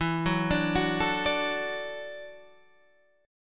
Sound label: E 7th Suspended 2nd Suspended 4th, Guitar, Standard; E7sus2sus4 arpeggiated; 12,9,12,11,10,10; JGuitar